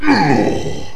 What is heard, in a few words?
death